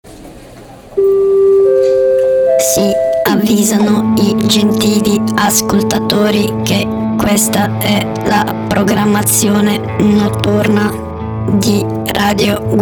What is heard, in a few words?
Jingle; rotazione Notturna